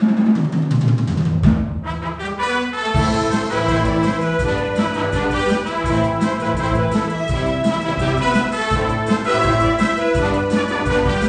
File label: Menu Song; NCAA March Madness 98; PS1